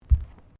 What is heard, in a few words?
Footsteps Bare feet Tiles Step; Footstep Foley; Footsteps (Sound Effects:Human)